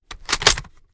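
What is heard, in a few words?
sniper reload empty fast open bolt; gmod; viper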